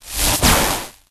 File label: candy lightning; Candy Crush Friends